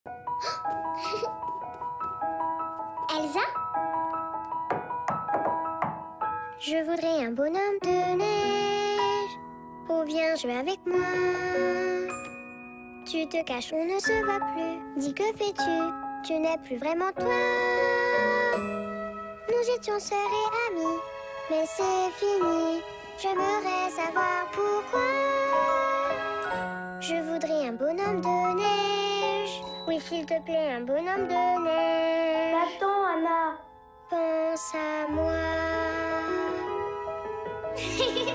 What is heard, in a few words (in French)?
version karaoké; La Reine des Neiges